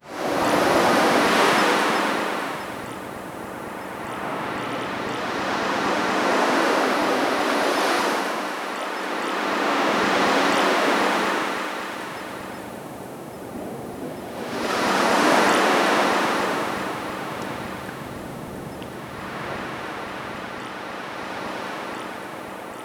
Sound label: chill ambiance sea waves